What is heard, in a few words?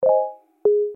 message